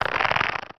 stretch

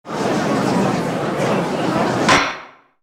gallery gavel

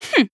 "hmph"; toko; drae

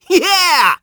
"ye-hea!"; sonic; vc roger

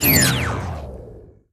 game over

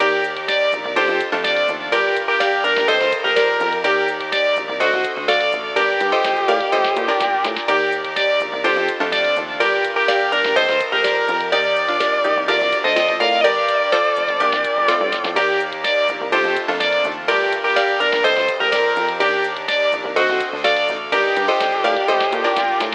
over the sky ringtone

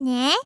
nyeh; himiko

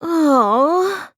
ouh; chihiro

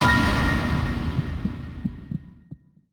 shock

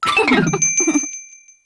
giggle; monokuma kid

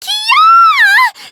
"kyaaa!"; kotoko

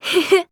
laughs

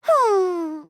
hmm; monophanie

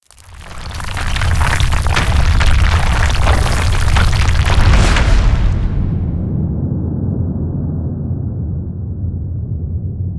rubble whoosh; bobby

